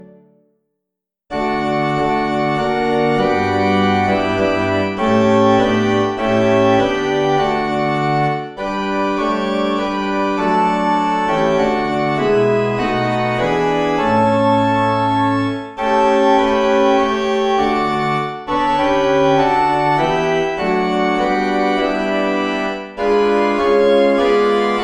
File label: Organ, extract